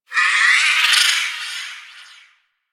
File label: Settlement Creature High Mid; Ambience; Warframe; Grineer Settlement